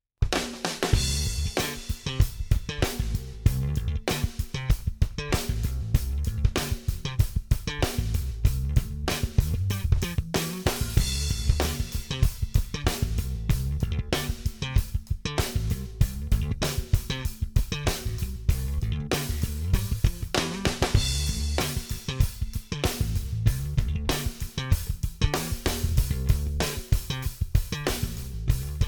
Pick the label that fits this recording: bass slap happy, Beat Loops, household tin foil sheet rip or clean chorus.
bass slap happy